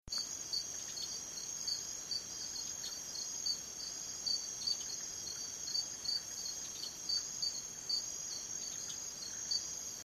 eleutherodactylus luteolus barbecue bottom jamaica; north-central Jamaica; The call of this species is a weak, low chirp heard here among the louder / ones of crickets.; West Indian